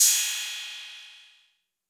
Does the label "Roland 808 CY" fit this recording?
Yes